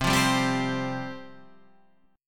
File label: C Suspended 4th C